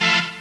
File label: seagulls